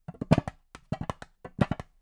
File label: LOOP Perc Mono